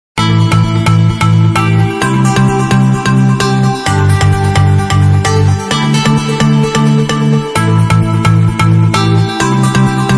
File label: Samsung Ace S ringtone